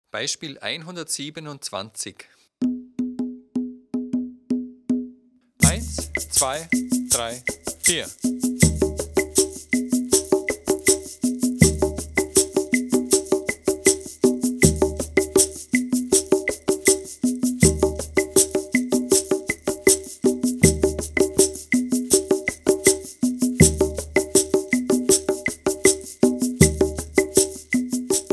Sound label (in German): viererrhythmus